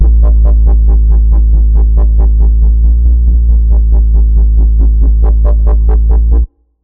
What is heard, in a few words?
Bassline C3 140, Dubstep; GhostHack